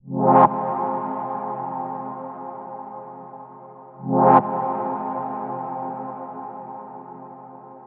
House Stab Loop Fm7, Deep House; 122; Techno; Ghosthack; AC2023 - Day 17 - Dub Techno & Deep House Chords

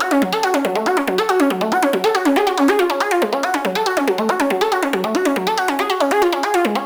Riddim Synth Loop F# Tension (140); Ghosthack; Riddim Essentials 2020

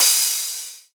Crash; Cymatics; House Starter Pack; Cymbals

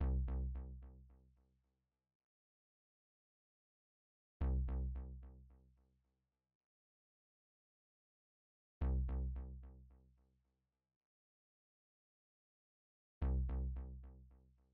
109 BPM C Min Bass; Cymatics; Mummy; PHARAOH

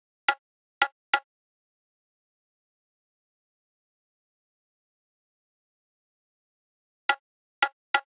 Drum Loop - 141 BPM Percussion, Drill; Loop Stems; PARADOX II - Drum Loop Collection; Cymatics; Harpoon Drum Loop

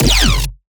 Riddim Bass Shot F#; Dubstep; Riddim Basslines; Ghosthack; Advent Calendar 2019 Day 17; Riddim Bass Shots

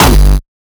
kick oneshot monster F; GhostHack

